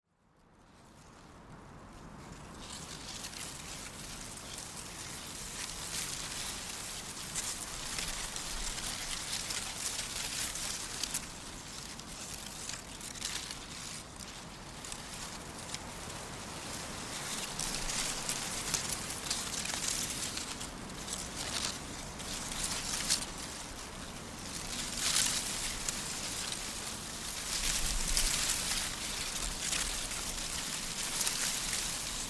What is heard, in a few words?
leaves in wind